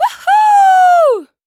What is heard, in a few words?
Accept